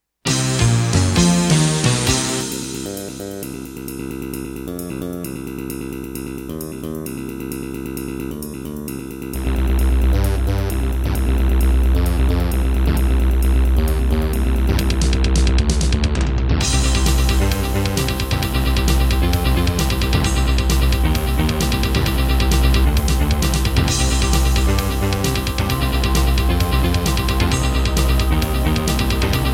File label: Serdaco Crystal Blaster C1; sigil doom episode 5